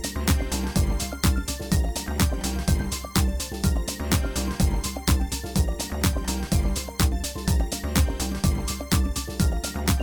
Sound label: Computer Dance Main Inst